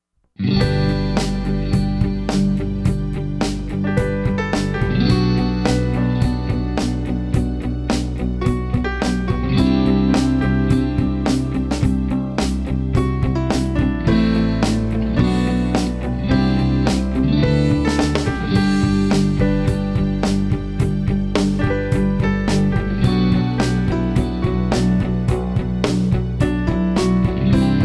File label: Inspirational